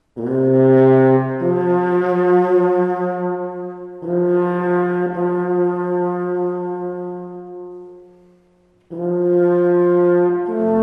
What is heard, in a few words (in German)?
Alphornsignal; Tulln